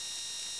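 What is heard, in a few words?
binoculars servo loop; machines mechanical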